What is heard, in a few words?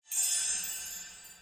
gem Fade; imperialriches